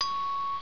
SUCK; DARKHOUR

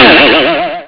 arrow, cartoons

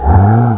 "AHHH"

